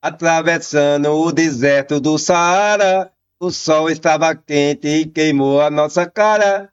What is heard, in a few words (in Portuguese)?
atravessando o deserto do saara cantando